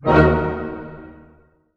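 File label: D# minor pianissimo, orchestra hits; Sound On Sound - Peter Siedleczek's Orchestra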